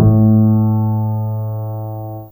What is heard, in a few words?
Piano Lo A2, Fairlight CMI IIe